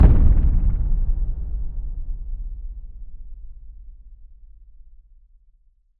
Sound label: Impact Boom Flutter; bottlerocket